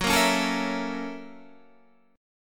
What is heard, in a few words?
F sharp 7th Sharp 9th, Guitar, Standard; strummed; JGuitar